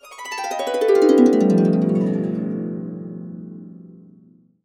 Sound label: B, Harps; USB Soundscan vol.62; AKAI